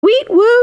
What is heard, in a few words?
Weet Woo